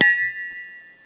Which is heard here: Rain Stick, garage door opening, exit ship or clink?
clink